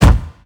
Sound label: enemy appear smoke